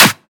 clap f sharp key, Royality free clap single shot tuned to the F# note. Loudest frequency: 4546Hz; percussion; single hits one shots